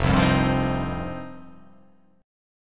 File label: F 7th Suspended 2nd